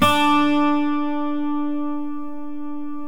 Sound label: 12-String D3; miniBAE source code audio